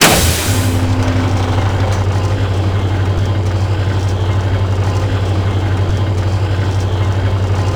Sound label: vault open, doors; ucsounds